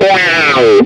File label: service logout; jam; stereo